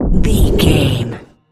bullet leave barrel slow motion

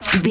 bullet flyby fast

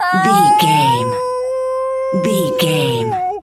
wolf howl

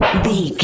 metal tin impacts hit hard

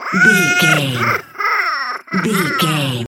goblin fairy laugh low

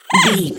goblin fairy attack

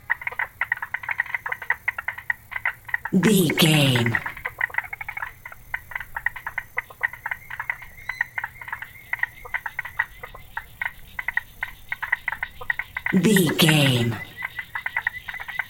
swamp ambience frogs loop

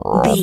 frog deep croak

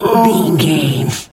bear pain hurt

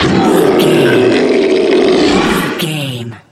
troll monster death

